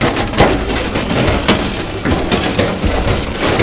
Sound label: scrap, crash; stunt rally